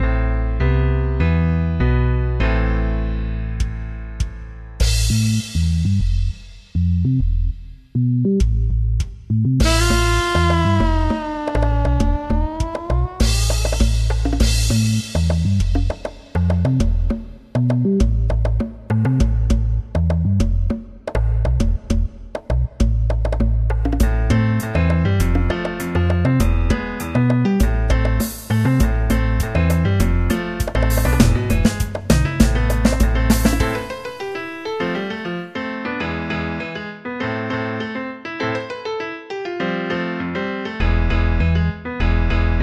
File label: bossa